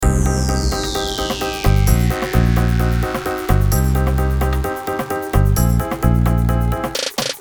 spying pop background loop

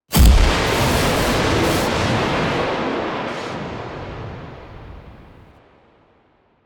loud cinematic impact

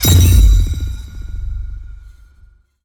apocalyptic stomp impact

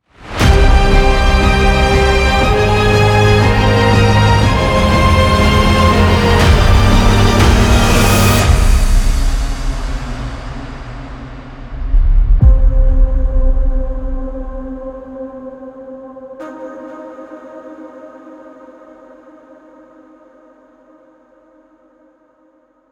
trailer cinematic

